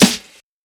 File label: Snare